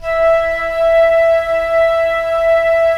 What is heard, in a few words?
FLT FL, Flute; Roland - LA Composer vol. 4